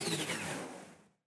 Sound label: hedgehog spin out long; battle